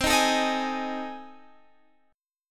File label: A Minor Major 7th Flat 5th